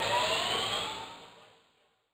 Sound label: Indust, Yamaha DTXpress-II FX